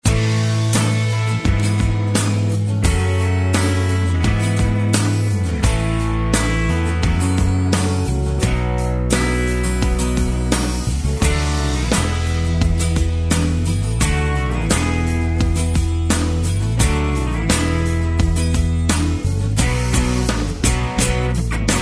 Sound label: country track